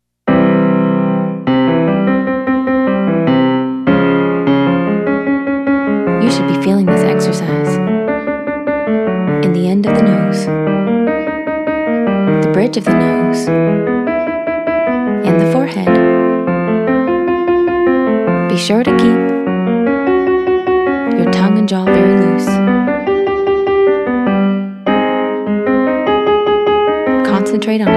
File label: Exercise 7 Nee Your Turn Men